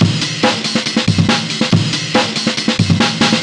LoFi Beats, 140 BPM; Vengeance - Essential Clubsounds Vol.4

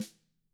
DW HIT1 PD-L, DRUMS SNRD; ILIO; Double Platinum Drums 2

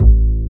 DR. LOOP, BASS; X-Static Goldmine 3

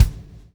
PULL, Backbeat; Spectrasonics - Backbeat

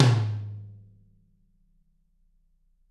TT, Toms; Bob Clearmountain Drums I

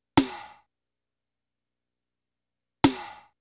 Snare, Snare Patterns; Beat Components

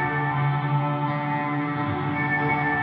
Gretsch Ring FX 170 D, guitar drone

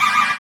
ROBO, Guitar; Spectrasonics; Bizarre Guitar

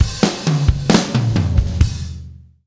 Fills, Monster Beats; Zero-G

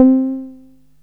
SOFT C4; Best Service Alpha Dance vol.2; SOFT SEQ.2

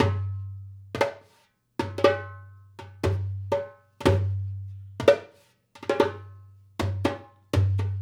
Dumbek; Essential Percussion

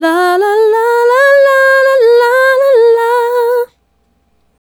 Female Solo, Vocal

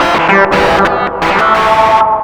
DISTLOOP, Big Beat Samples; DISTLOOP KIT; AKAI

